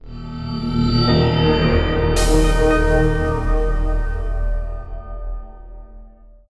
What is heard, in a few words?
Transition End; Everspace 2